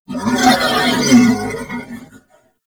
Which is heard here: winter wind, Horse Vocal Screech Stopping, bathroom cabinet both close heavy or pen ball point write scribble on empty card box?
Horse Vocal Screech Stopping